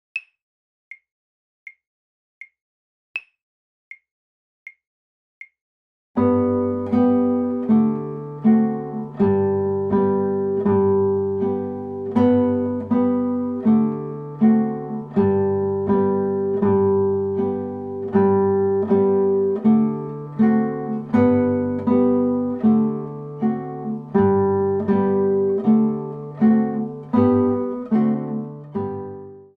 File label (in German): leiermelodie